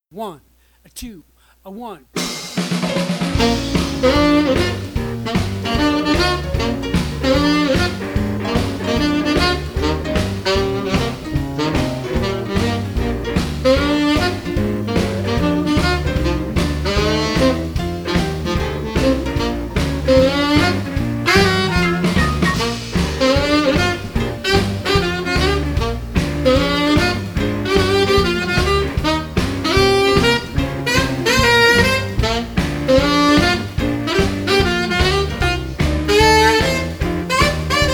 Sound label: pentatonic major exercises, saxophone